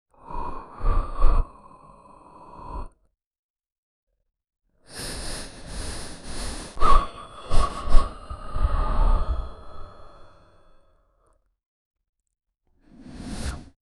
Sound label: a troll sniffing the air